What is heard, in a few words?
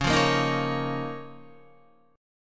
Db 6th Add 9th Db down Guitar; Db6add9 strummed; JGuitar